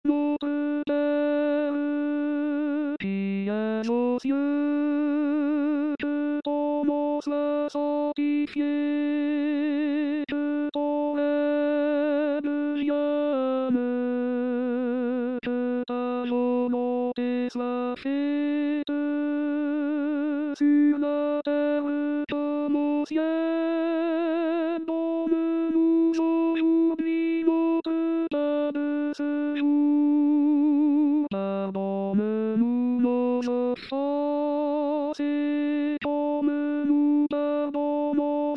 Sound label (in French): notre pere tenor; R. Korsakov